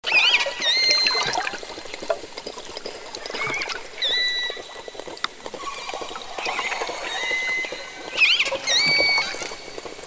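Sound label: Offshore, whales; Killer Whales (Orcinus Orca) are distinctively marked black-and-white dolphins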